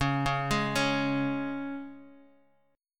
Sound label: C sharp 5th, Bass Standard; arpeggiated; C#5; JGuitar